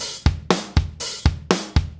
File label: 120 Solid Rock Drums, Fast Tempo; Pop Rock; Digidesign; Pro Tools; Pro Tools Essential Loops